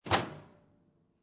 Microwave Door Open, Household, Microwave, Door Open, Modern Microwave; Kitchen